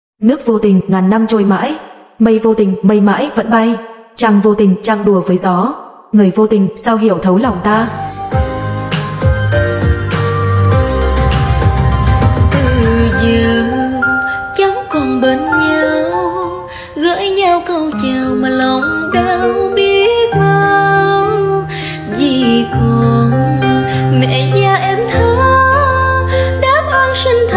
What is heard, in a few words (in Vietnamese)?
nhạc chuông